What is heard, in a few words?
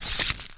knife slash; tfa starwars melee weapons pack